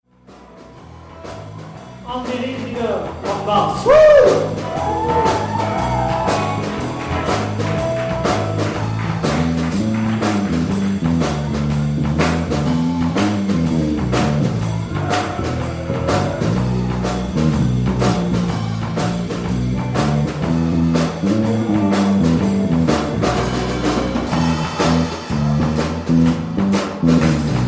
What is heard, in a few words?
Bass Solo